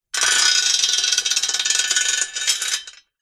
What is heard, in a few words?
industrial chain on metal movement